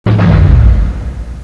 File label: elev stop; cruisecontrol